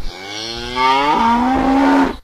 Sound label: cow white; animals; mammals; stamps